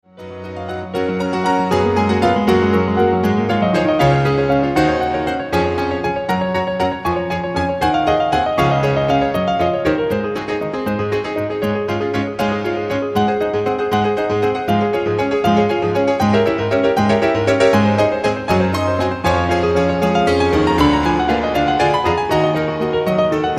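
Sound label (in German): Präludium in Cis-Dur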